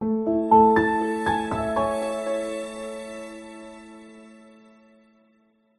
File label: multimedia musical branding intro piano soft choir sincere